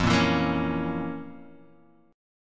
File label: F Major 7th Suspended 4th Sharp 5th, Guitar, Open F; strummed; 5,5,4,5,4,x; JGuitar